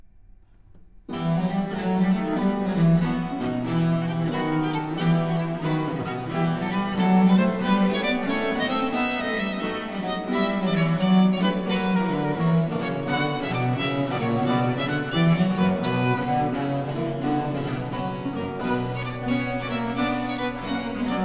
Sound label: Sonata Sexta in F Minor for Violin IV. Allegro